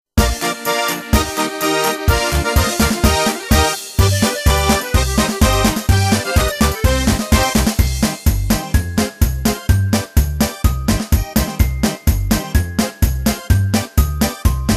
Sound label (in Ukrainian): pisenka pro novij rik minusovka